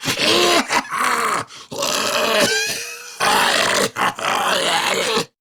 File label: Laugh Grineer Ghoul Sargeant; Grineer Ghoul Drill Sargeant; Battle Barks